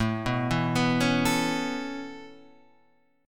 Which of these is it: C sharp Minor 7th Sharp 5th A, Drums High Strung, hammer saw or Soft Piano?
C sharp Minor 7th Sharp 5th A